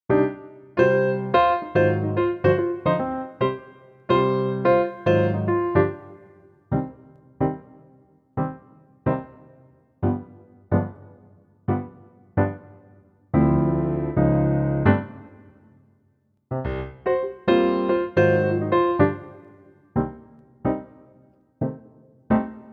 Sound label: Cm Piano